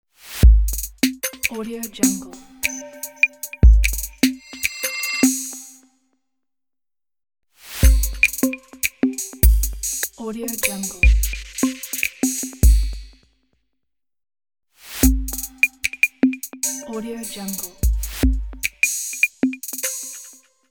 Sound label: minimal ident